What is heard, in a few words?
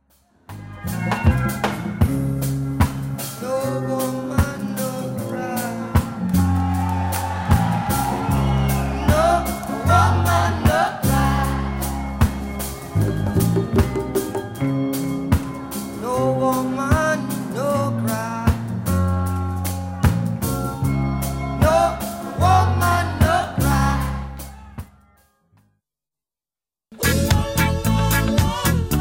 Calypso raggae